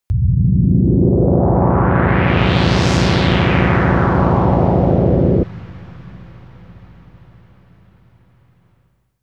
dangerous universe pad

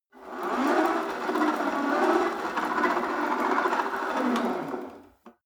rope trainer pull sequence medium, fitnessstudio; sport freizeit

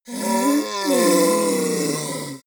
ogre grunt painful short; kreaturen

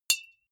deodorant hit hard, metall; soundpool

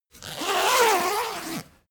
zipper midsize run; objekte; reissverschluss

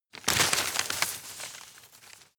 rustle hard short; foerster

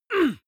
male fight pain groan very short; comedy-cartoon; soundpool

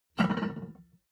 plate on plate take hard

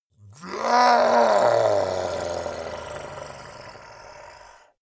monster dark growl; kreaturen

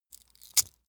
metal pouch impact rich

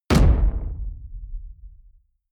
sci-fi space pirate gun fire dull

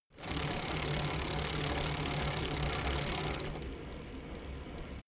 bicycle rickety chain pedal roll short

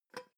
pot lid open soft; objekte; topf

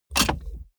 car bmw e46 interior safety belt clasp inset hard

